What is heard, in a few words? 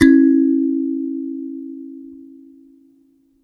Finger, Prepared Kit; Battery 3 Sample Kits